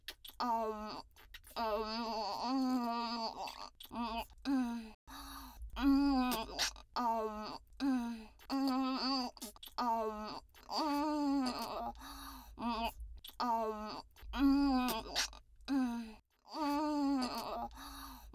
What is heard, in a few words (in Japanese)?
フェラ音